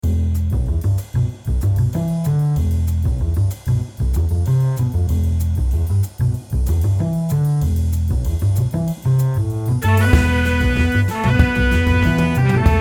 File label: Late Night Jazz Alto Groove